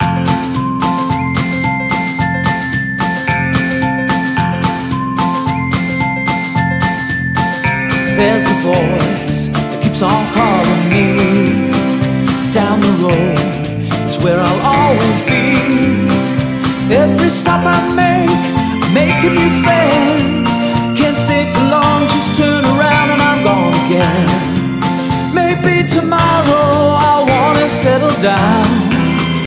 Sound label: GuitarMusic